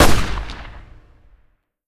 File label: infantry fire standing; Hearts of Iron IV Death or Dishonor